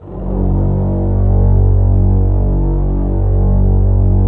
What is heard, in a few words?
LOW VLNS G1; Q Up Arts - Denny Jaeger Master Violin Library